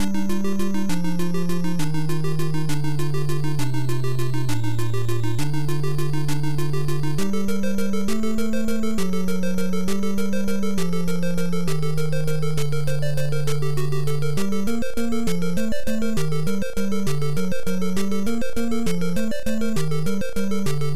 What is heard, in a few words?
Mazurka; Mazurka Corridor; Digital Devil Story Megami Tensei; Family Computer